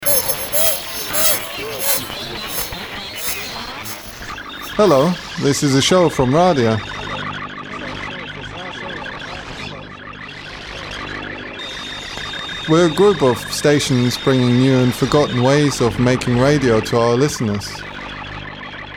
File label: jingle